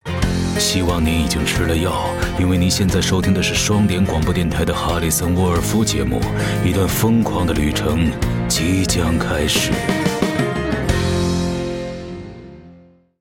Mandarin Sting; 哈里森（双点校园）; 开场白

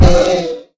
zombie horse hurt; Minecraft

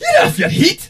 hurt voice; ash

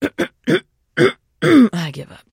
trader clear throat; 商人杰恩（七日杀）

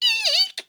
Sorceress hit

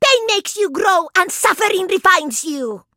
hurt vo; 露米; lumi

